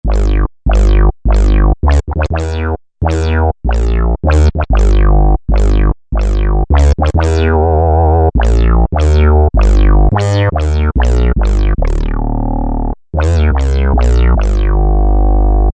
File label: Squelchy Bass; Roland SR-JV80-11 Techno Collection